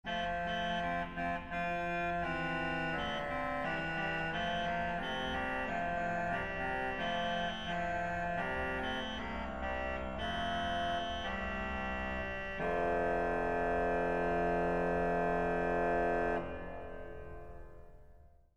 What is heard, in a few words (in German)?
dulzian, orgelseite